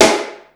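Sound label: snare amb, Yamaha Motif ES drum machine; 300 Drum Machines; Yamaha Motif ES-Series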